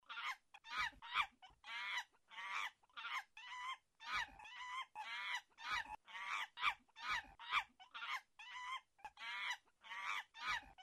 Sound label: Monkey